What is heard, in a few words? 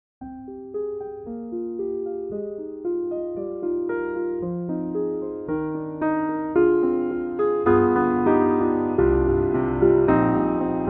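Filter, piano; Soniccouture; The Hammersmith Professional Edition; Filter Pillow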